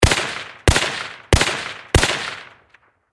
Warfare Rifle M1 Carbine Semi Automatic Four Shots Close Perspective